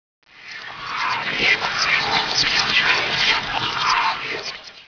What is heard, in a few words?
spooky